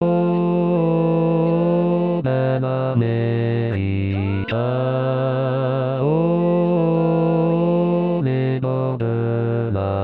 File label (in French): basse